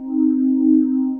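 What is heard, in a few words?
Ambience Sci-fi Energy Loop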